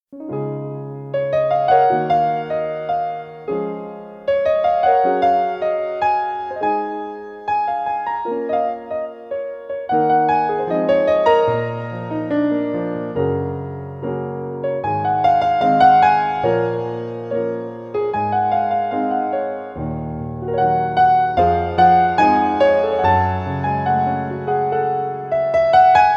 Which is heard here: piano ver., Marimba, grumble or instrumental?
piano ver.